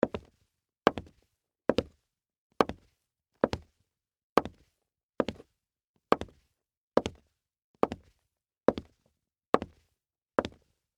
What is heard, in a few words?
Leather Stiletto, Boots, Walk, Wood, Surface, Slow, Footsteps; Footstep Sound Effects; Blastwave